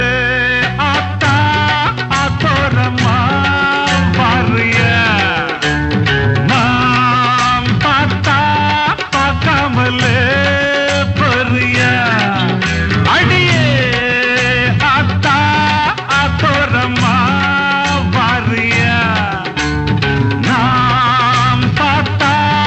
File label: 80s Hits